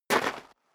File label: step sneaker gravel light single right